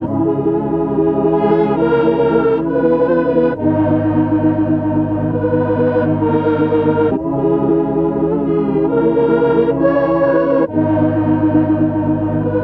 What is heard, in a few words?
135 BPM G# Min Prophet 6 Organ; Cymatics; Monarch Melodies; Analog; Levitate